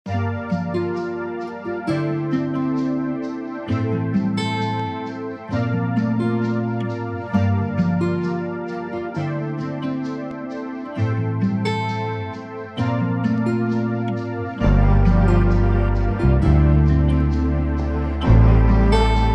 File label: 132BPM